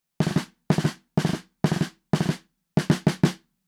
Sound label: Beyerdynamic M 90 Pro X Snare 30cm